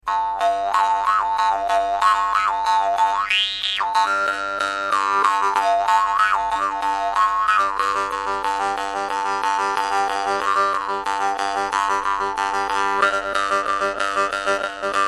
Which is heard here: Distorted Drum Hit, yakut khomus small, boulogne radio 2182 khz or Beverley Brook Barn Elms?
yakut khomus small